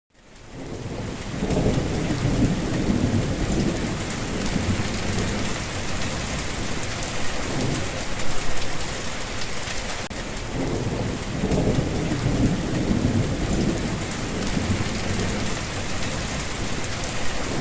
heavy rain sounds heavy rain sounds for sleeping rain and thunder sounds